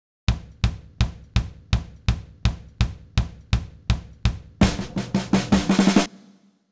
drum intro bnc

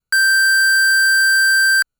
Square G5; fleet keys Samples

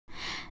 br Medium, BreathWav; VOCALOID6 AI Megpoid SOLID Voicebank; Vocaloid 6.5.1

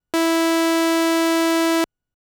Ramp E3; fleet keys Samples

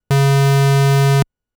Sub C#3, keys; fleet keys Samples

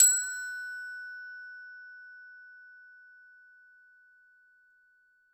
Hard plastic F#4; fleet keys 2 Samples

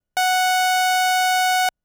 Ramp F#4, keys; fleet keys Samples

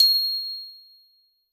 Hard plastic f C6, keys; fleet keys 2 Samples

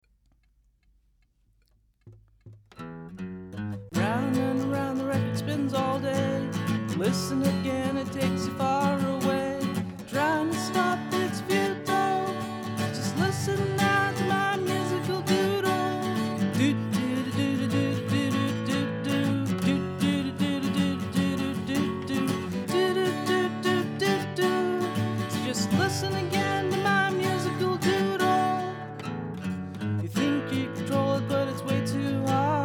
Musical Doodle

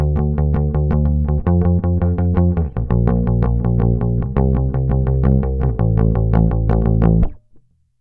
BASS

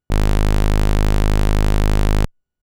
Sub G#0; fleet keys Samples

